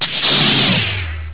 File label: light saber